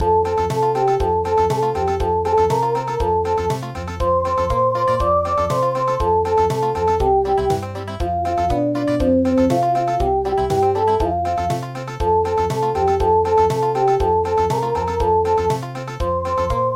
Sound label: Country